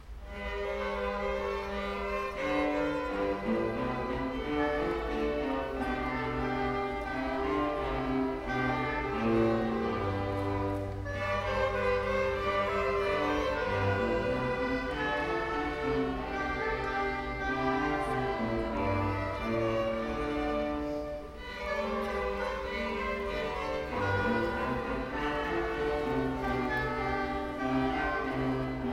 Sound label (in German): Junges Ensemble Marsch; Konzertmitschnitt vom 19. Dezember 2023